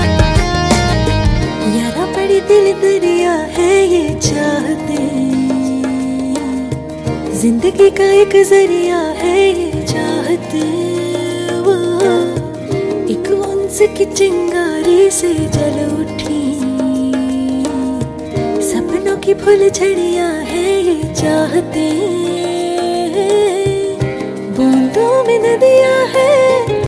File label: hindi ringtone